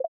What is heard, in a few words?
tap